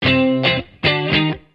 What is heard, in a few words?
Guitar notification